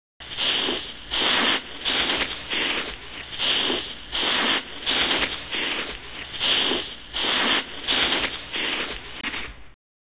footsteps unisex snow boots

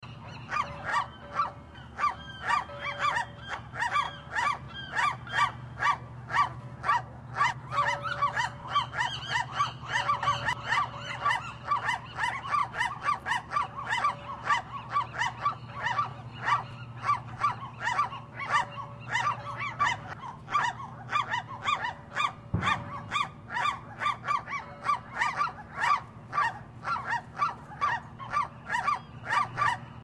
atmo harbor close and distant gulls

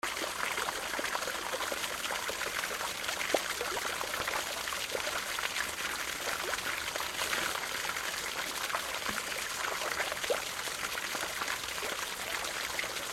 waterfall small size medium flow

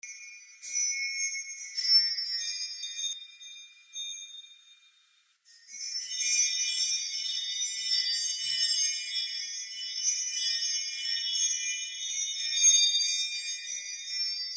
chimes crystal resonance

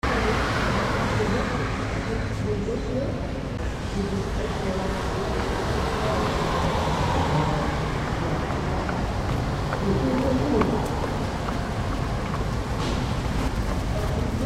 atmo germany berlin breitscheidplatz

